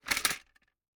Gumball machine, metal, glass, candy, switch, mechanism, rotate, dropping, jumbled; Eiravaein Works; Vaeyan IV